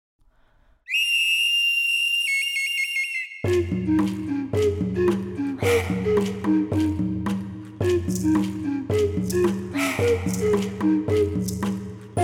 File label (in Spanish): la ocarina; mesoamerica